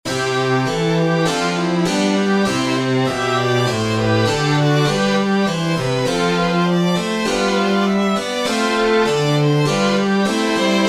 sonata violin continuo allegro

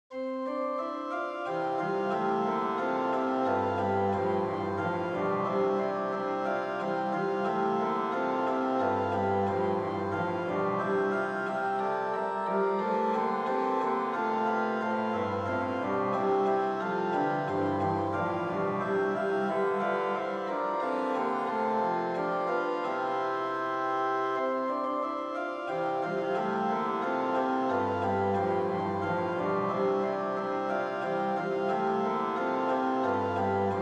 tempus adest floridum organ; Virtual organ; Traditional

